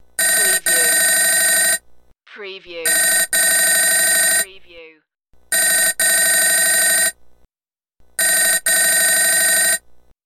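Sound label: Telephone 4 Rings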